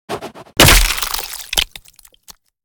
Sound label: axe throw; battle